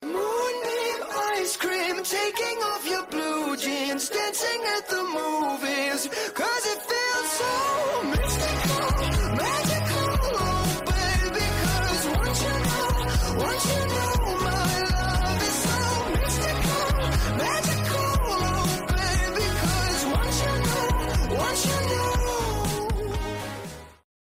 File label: ringtone